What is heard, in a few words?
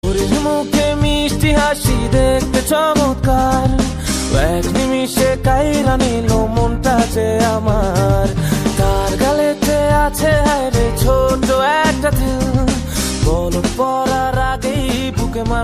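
bengali ringtone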